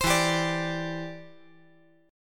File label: E Minor 7th Sharp 5th, down